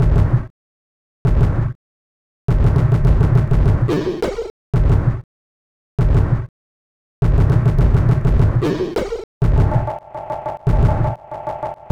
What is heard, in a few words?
Sinewave